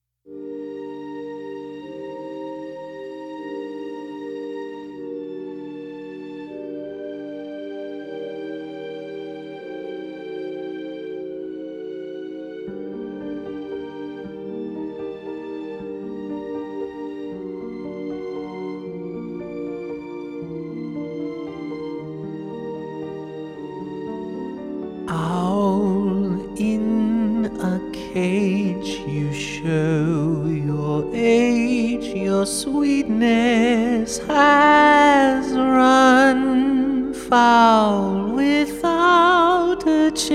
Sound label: Sings; Stolas; Helluva Boss